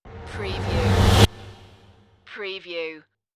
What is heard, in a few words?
Rush